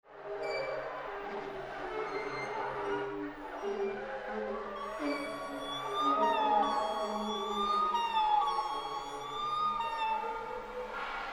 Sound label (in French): souffle